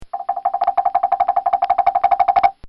Koren Temple Blocks

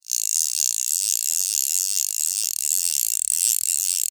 Sports Fly Fishing Reel Pull Long

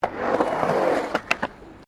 SKATEBOARD SKATE BOWL TURN STOP; Skateboard sounds; Skateboarding; Sound Effects:Sports

